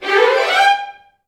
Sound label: VI RUN MJ G4, Advanced Orchestra; Best Service